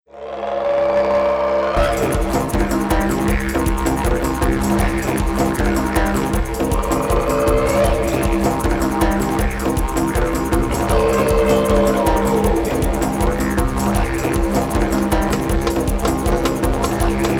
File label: Jam the didg techno